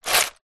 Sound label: foley shells in plastic container movement; zapsplat